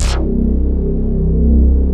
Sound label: DRIVER SYN; Pro-Rec - Synthsonic